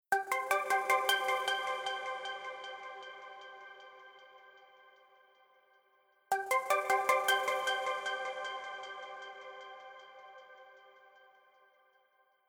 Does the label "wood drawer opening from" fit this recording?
No